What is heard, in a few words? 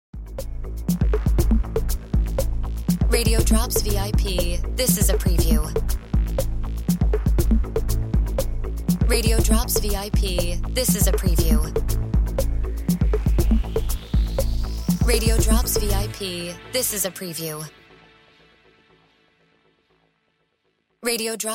No FX Music Bed